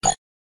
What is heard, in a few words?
computer error